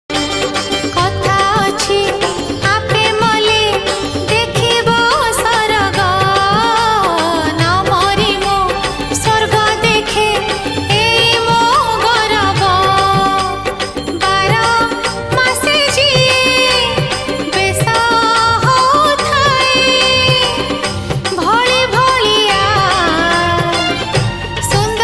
jagannath bhajan odia

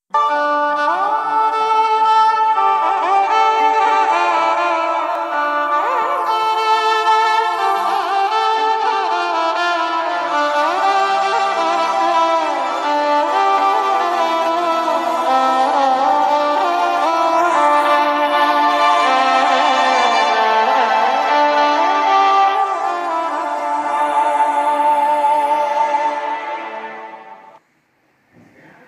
song violin cover short version

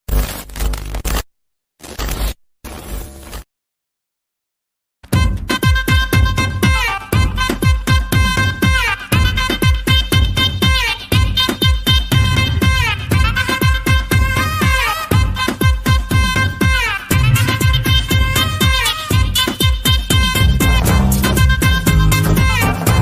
bgm ringtone tamil bgm ringtone; maryan

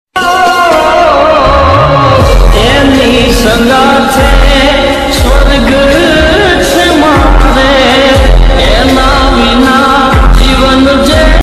ringtone

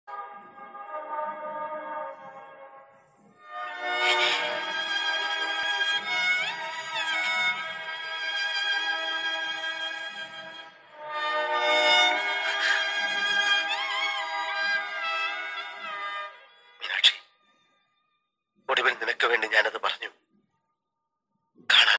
emotional bgm; pavithram

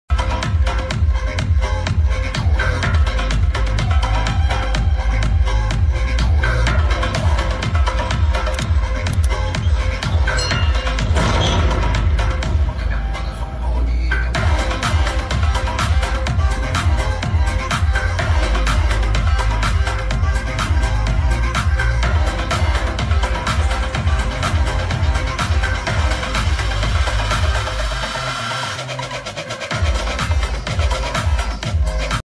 interval bgm ringtone; Om Bheem Bush movie

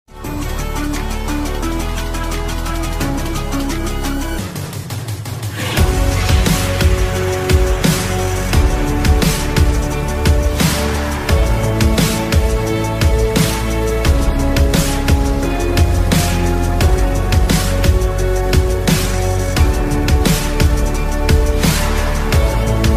instrumental ringtone

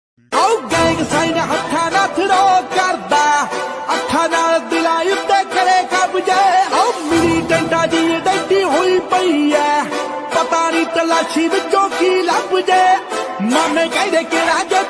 punjabi ringtone attitude ringtone

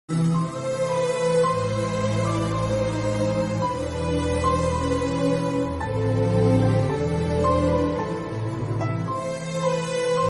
love telugu bgm ringtone; parugu movie

